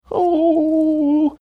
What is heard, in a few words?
gobble like thinking sound